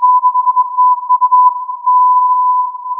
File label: filtered input